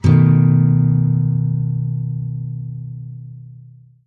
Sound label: Guitar strum; Phasmophobia